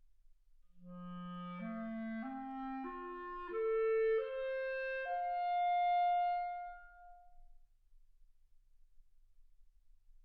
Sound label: clarinet; single mic